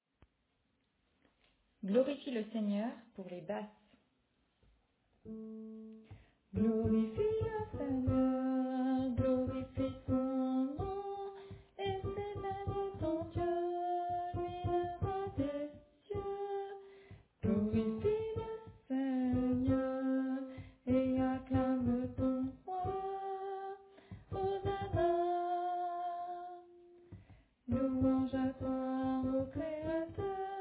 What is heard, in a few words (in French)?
Basse